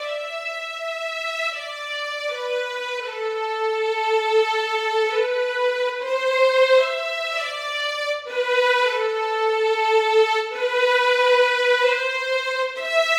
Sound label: violin